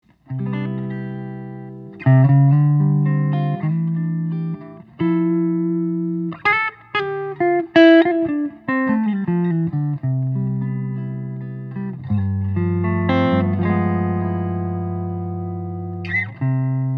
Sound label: electric archtop guitar; jazz master; all maple; Roger Borys